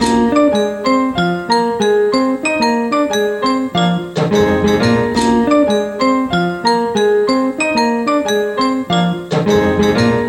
Mambo Rhythm

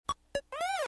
video game sound effect

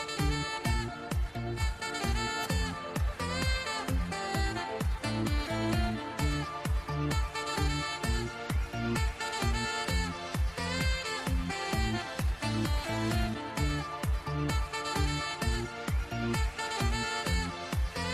Epic saxo

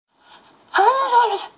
Weird Noise

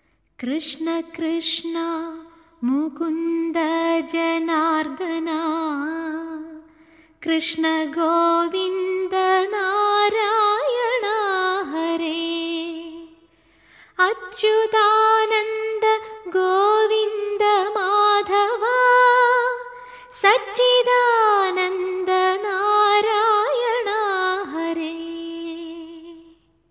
hindu krishna praise

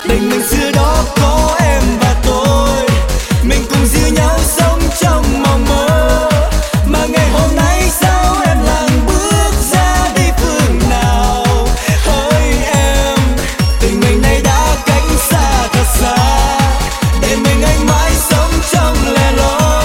vietnamese

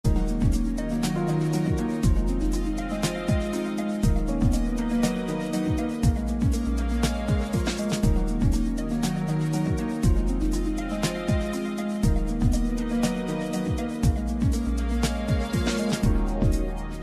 old mall theme remix; playstation home

